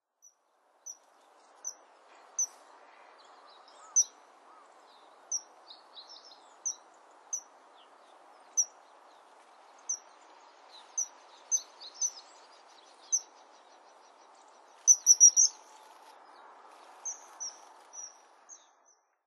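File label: kawasemi, Common Kingfisher; watarase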